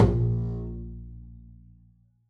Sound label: pizz bb1, double bass; dsmolken